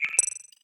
code library close; Ben 10 - Omnicode

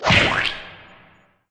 hit; Be Cool Scooby-Doo! - It's Dark Out There; ThrillGames

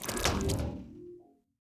upgrade pop out grate; Ben 10 - Omnicode

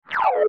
Character Hide In; Scooby-Doo - Barrel of Treats

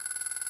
number count up loop; Ben 10 - Escape Route

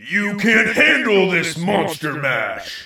win

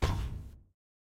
footstep heavy metal; Ben 10 - Omnicode

